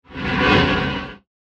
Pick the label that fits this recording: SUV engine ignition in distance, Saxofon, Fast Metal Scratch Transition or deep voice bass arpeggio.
Fast Metal Scratch Transition